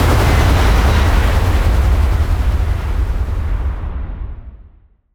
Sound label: jumping through rooms